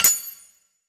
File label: UI inlay